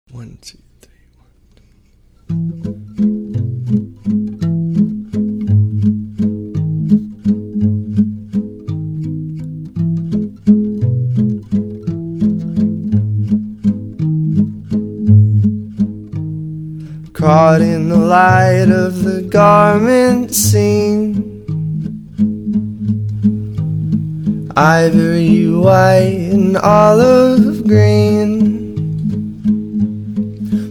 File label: Waltz